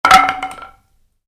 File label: Baseball Bat: Dropped Onto Concrete; Sports; Stuart Duffield